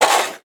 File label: Weather Snow Shovel Stab Snow On Cement Short; AdobeCC